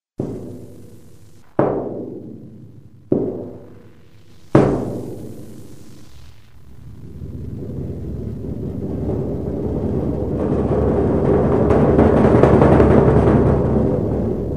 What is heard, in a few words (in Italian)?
grancassa